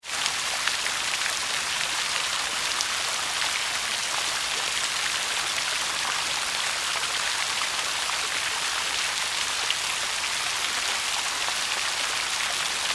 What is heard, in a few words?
Fountain Large Rain on Water; Regents Park Fountain; Weather; Fountain Large - Rain on Water 2 - Regents Park Fountain